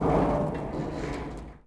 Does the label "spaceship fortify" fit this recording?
Yes